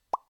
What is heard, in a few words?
pop